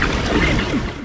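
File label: step water; quake2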